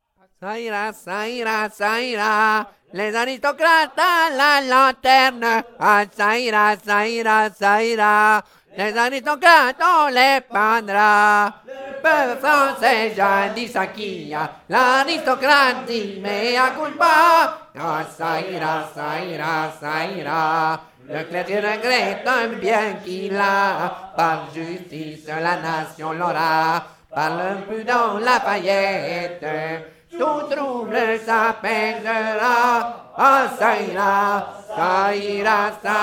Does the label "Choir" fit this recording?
Yes